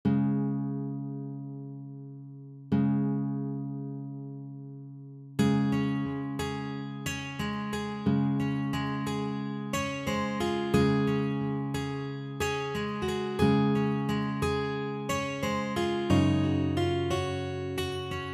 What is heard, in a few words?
12 bar blues